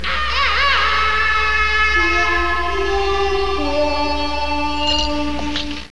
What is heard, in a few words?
waawaa; gunslinger